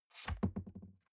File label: cardboard box open unfold bounce; objekte